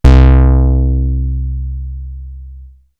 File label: ANALOG, BASS; Best Service; Hyper Dance